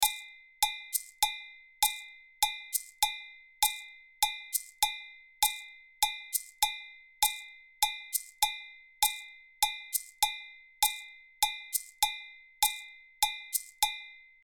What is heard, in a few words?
2 against 3